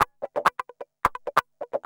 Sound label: GUI, LICKS; 130 LICKS